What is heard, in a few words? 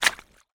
footstep water ice puddle deep; foley